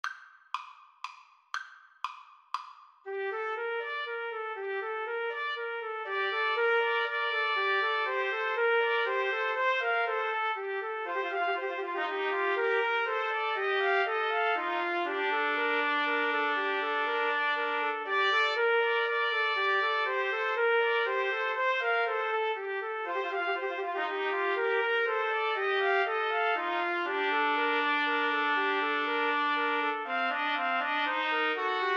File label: TPT trio, Trumpet Trio